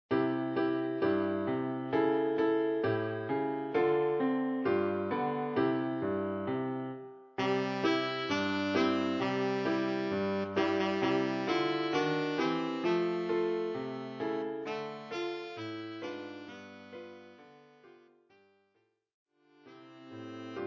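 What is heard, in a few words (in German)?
Altsaxophon; Jingle Bells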